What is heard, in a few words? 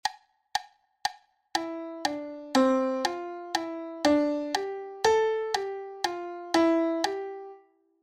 syncopation